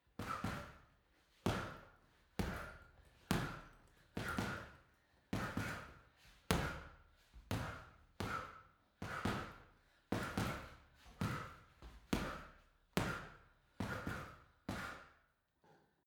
boxing punching bag boxing sequence female breath reverberant; boxen